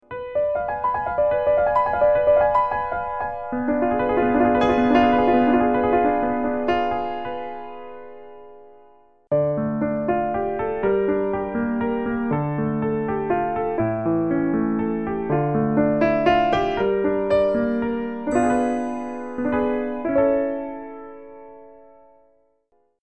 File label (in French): septieme dim